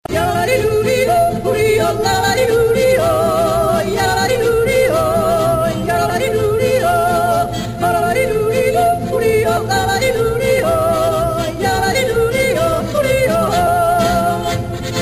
yodeling

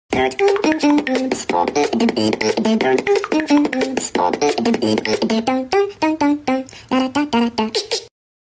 goofy beatbox calling

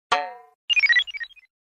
fail sound; stmath

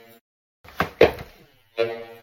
critter

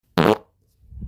wet shart

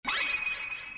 power effects

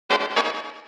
battle start jingle

